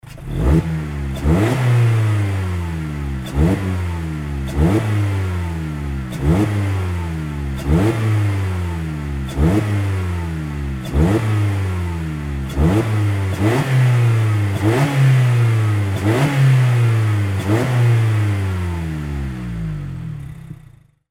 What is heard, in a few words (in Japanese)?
normal kyuki; nd5rc